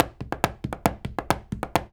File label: 133 STEPS, Spanish And Gypsy